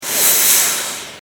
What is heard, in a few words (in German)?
vox atem